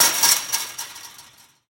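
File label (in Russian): звон металлической сетки при ударе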